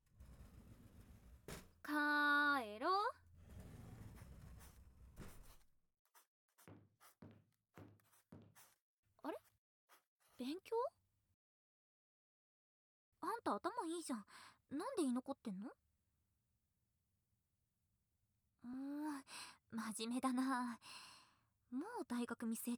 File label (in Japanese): 耳舐め, ASMR; 耳舐め素股でザーメン出してね